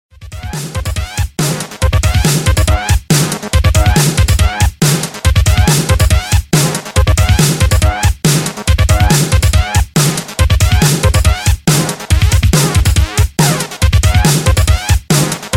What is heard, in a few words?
Fast Beats